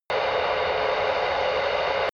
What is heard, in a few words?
drone spaceship vent hard